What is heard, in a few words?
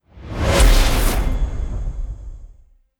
Simple Transition